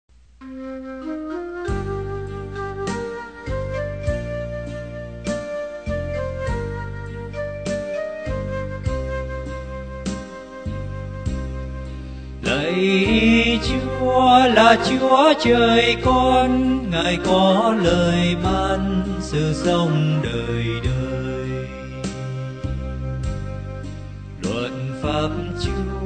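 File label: soprano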